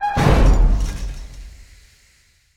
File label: Tunnel Burst; Room